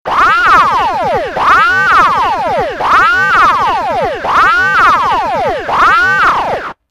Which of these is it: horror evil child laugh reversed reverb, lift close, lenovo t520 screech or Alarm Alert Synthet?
Alarm Alert Synthet